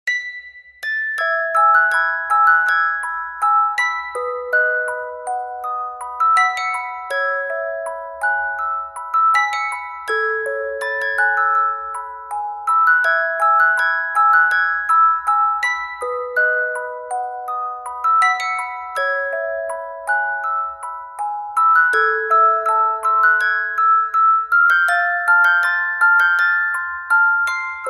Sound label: music box, ringtones; moana; Disney